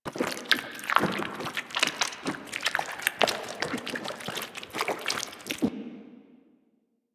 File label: Goop Slime Fall; Ambience; Props; Warframe; Infested Eris